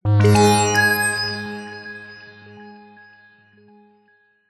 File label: multimedia game sound tone big event generic retro synth